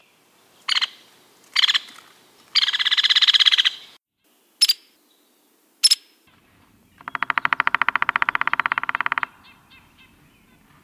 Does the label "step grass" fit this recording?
No